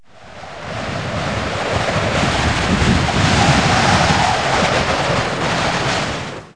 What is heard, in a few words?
Whistling Wind; Outdoors & Nature; The Elements; Wild and Wacky Sound Software